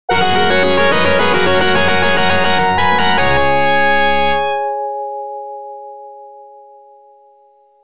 round clear; magic sword heroic fantasy

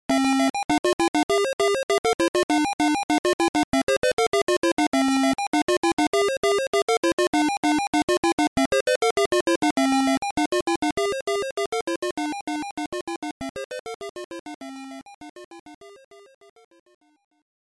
main bgm

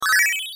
coin

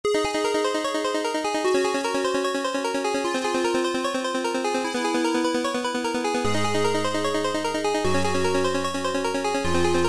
ten million point achievement name entry

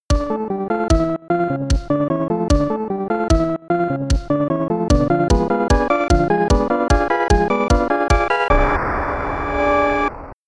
round clear; crystal s theme

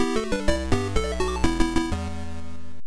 game success

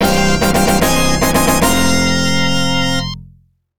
stage clear

